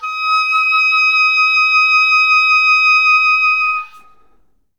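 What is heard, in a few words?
vibrato D#5; Best Service; Peter Siedlaczek's Advanced Orchestra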